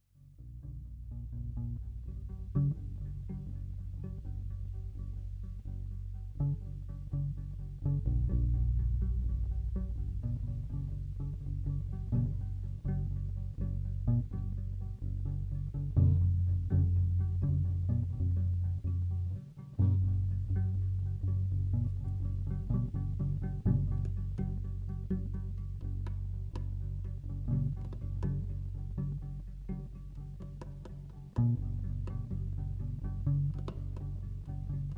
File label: F in 11